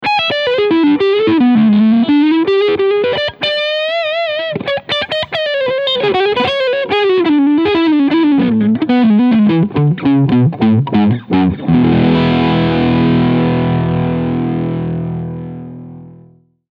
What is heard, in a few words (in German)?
Beide PUs Crunchsound